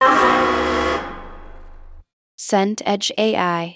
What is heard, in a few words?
buzzer end game